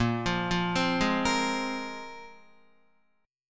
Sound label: Bb 7th Suspended 2nd, Guitar, Bb7sus2 chord; 5,8,5,5,8,6; 4F,4A,5C,5G,5C,6E; Bb7sus2 arpeggiated; JGuitar